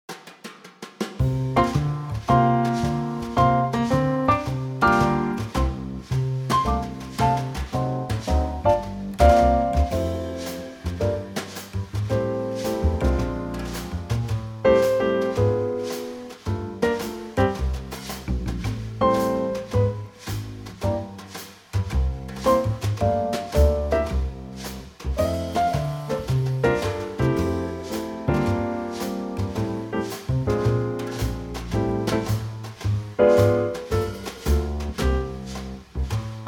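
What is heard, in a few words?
Trio F, Backing Tracks for Male Vocals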